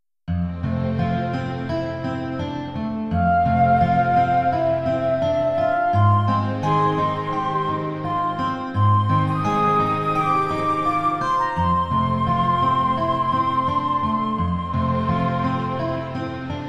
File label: Romantic Music Cue; Memorable Music for Films